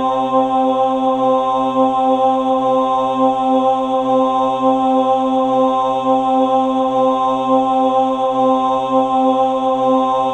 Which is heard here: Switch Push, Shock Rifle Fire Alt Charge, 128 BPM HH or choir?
choir